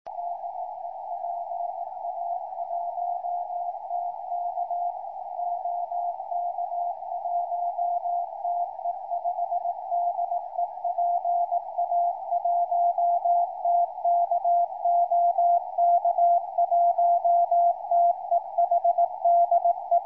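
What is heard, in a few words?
CW qso, 432 MHz, amateur radio; PA1TK JO22IJ 725; qso PA1TK - OK1TEH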